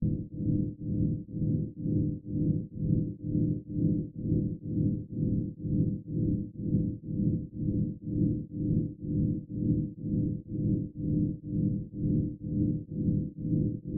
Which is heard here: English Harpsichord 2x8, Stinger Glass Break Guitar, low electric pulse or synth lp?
low electric pulse